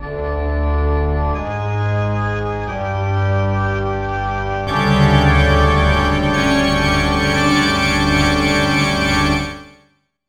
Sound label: BGM Horror; Irodzuki Tingle no Koi no Balloon Trip; NDS